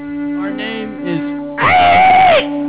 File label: aaiiee